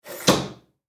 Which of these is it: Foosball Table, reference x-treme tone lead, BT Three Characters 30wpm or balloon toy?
Foosball Table